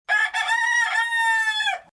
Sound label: rooster